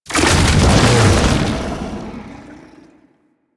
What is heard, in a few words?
Proto Infested Babau Underground Attack Cast, Enemies